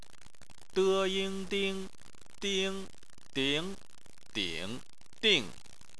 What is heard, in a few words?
ding